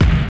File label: beat kick